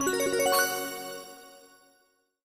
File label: micro win count up; fireflies